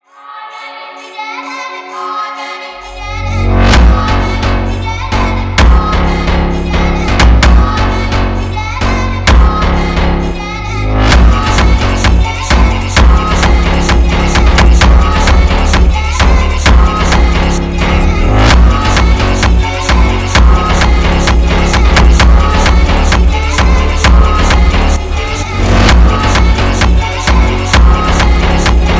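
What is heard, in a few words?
fank, brazilian phonk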